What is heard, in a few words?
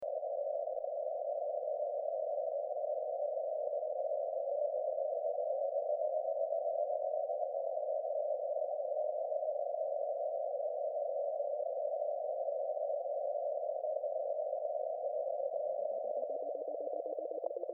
K2 Bw400 Preamp On